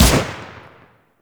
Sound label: Grineer Emplacement Shot; Weapons